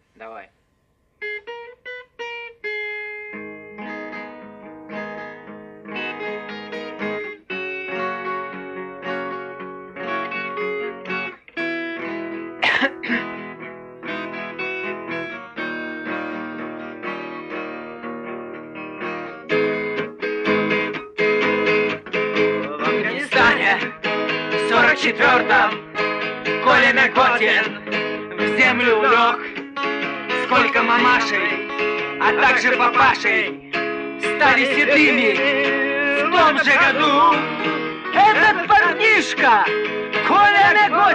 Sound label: unplugged